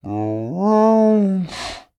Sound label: Animal Mammal Carnivore Cat Wildcat Moan; animals; AdobeCC